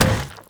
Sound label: Zombie Run Metal, Footsteps; Warframe; Infested